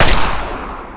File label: rifle car, weapons